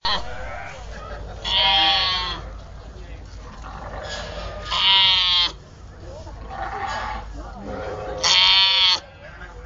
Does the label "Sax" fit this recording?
No